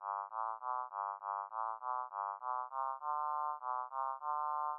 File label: frere pitch